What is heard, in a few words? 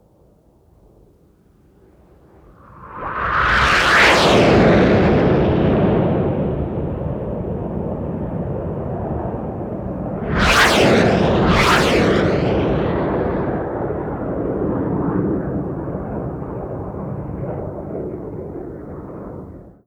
Fighter Jet Rip By; Fighter Jet Rip By 02; Vehicles; Aircraft